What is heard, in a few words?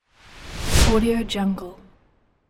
a swish